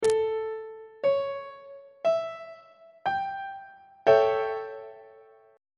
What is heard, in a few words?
g double sharp dominant 7th chord on treble clef